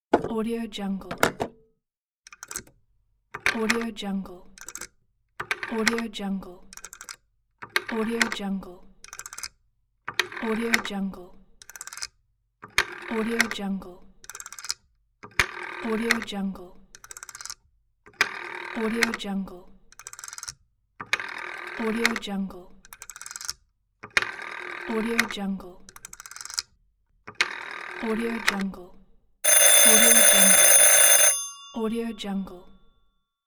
vintage telephone pack

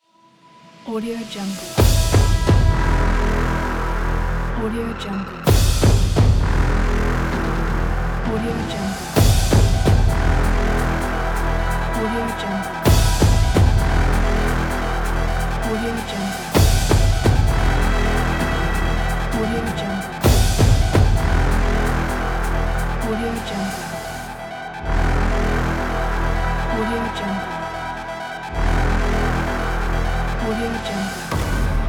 epic trailer intro

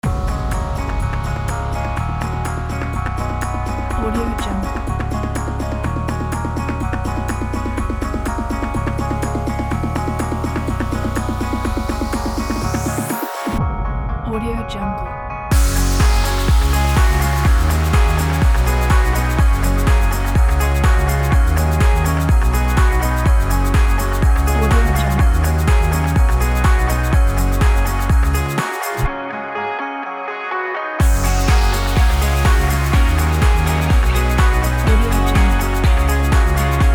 energetic upbeat corporate inspire

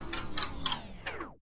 transporter

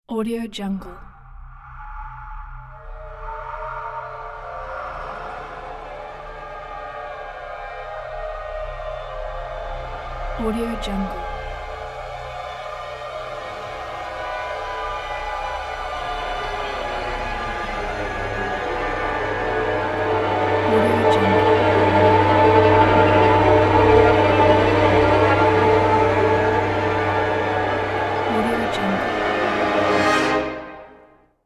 cinematic horror ambiance ident

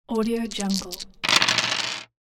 dice

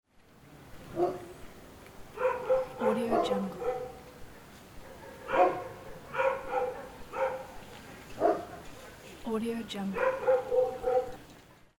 dogs ambient

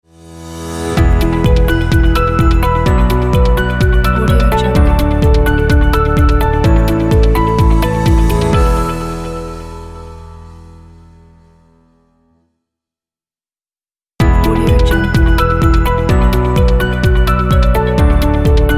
corporate opener ident

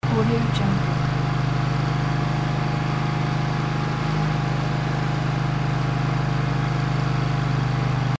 power generator loop; fxprosound

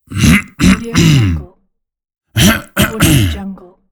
clearing throat

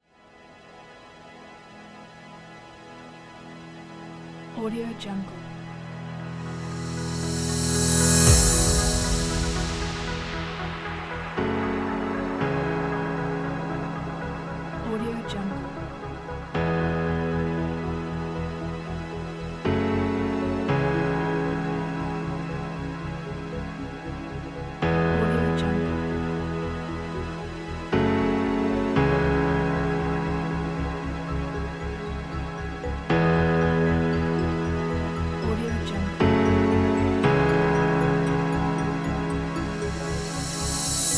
soundscape ambient documentary

